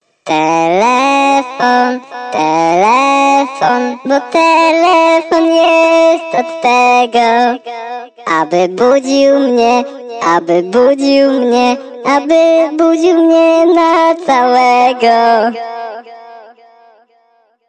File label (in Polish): Budzik Telefon